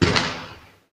cannon; bang

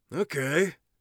sceptical, warrior voice; Warrior Voice Pack

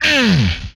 hit, vocal; MMRetroArcadeSoundsPack

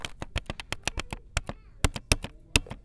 Gentle Clicks; BB Retail Therapy Sample Pack

